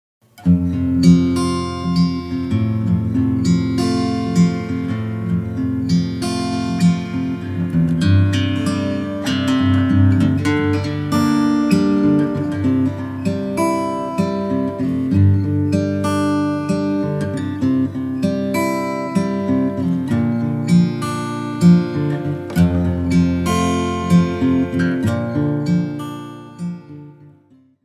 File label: Goodbye Is Not Instrumental